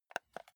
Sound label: console controller home press slow